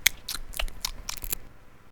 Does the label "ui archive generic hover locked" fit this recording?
No